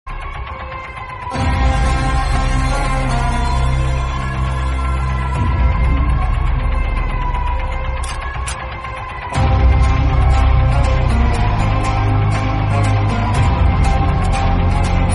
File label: bgm attitude; kgf